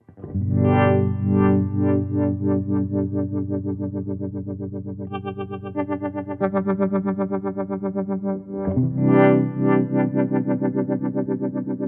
pedal filter trem

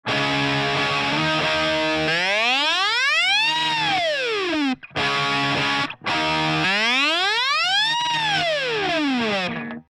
takeoff

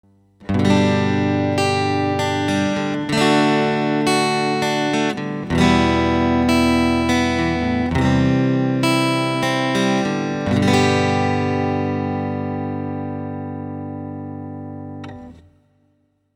acoustic sim

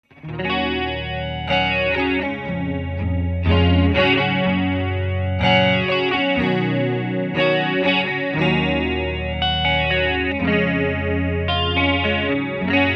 chora flange

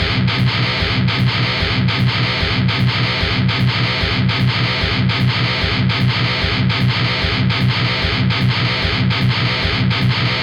Triaxis Lead

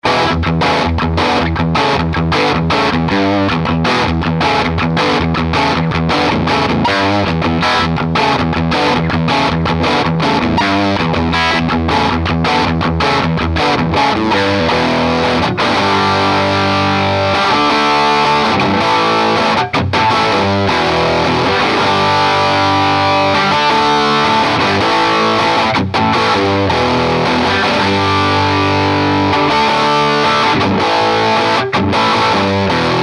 AC30C2 Channels Jumped with Humbucker, amp

